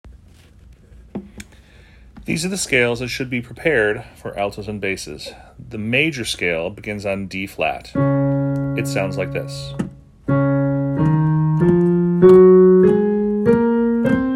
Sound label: Scales Alto Bass Starting Pitches